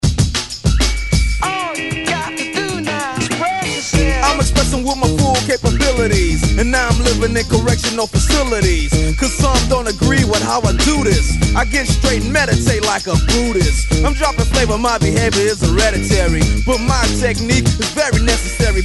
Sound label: 80s 96Bpm; DJDOLLS